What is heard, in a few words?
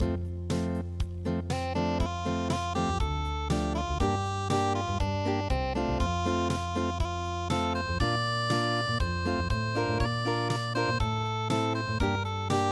lochlomond unpluged